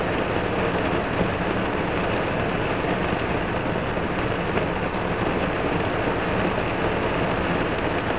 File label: Light Rain, Weather